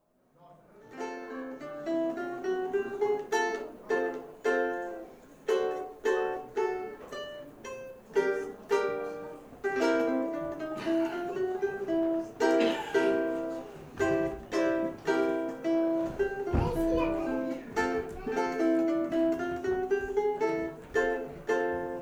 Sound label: Polka Mazurka, ukulele